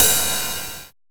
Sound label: One Shot Ride, Deep House; Lover Groove; Ghosthack; Advent Calendar 2019